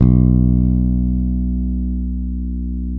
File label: SUSTAIN, Bass